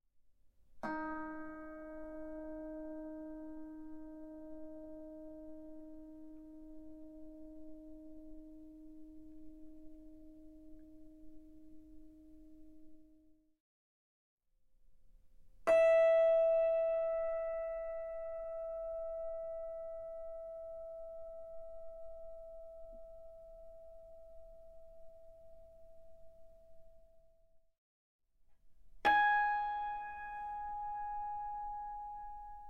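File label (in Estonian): flageoletid, Kannel; Tavaliselt kirjutatkase, mis noodilt oktaav-flažoletti mängitakse, mitte mis tegelikult kõlab; Resonabilis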